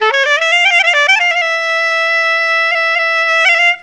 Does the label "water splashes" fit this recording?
No